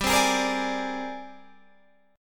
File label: G sharp Major Sharp 11th G, chord